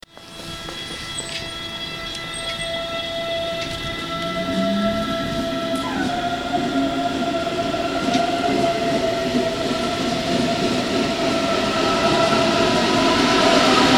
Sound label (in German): S-Bahn; geraeuschebingo